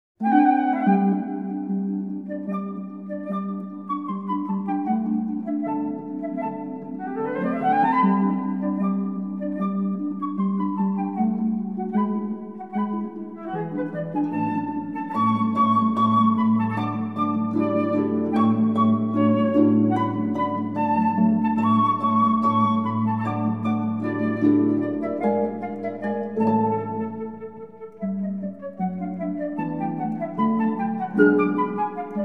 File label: Flute Harp Polka